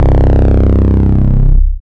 808 c fold, rage distortion

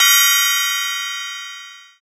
crash modal; amapiano log drums

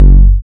kick boom beater, boom bap; boom bap modern

